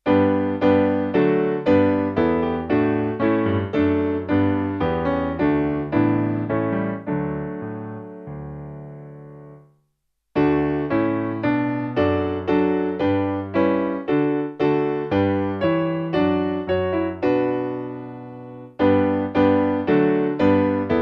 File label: Piano